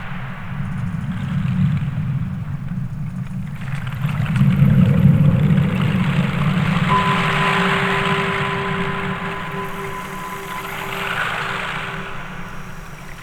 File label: Cave Bell, Ethereal Textures; Sonic Foundry (Sony Creative Software); Ma Ja Le; Soundworlds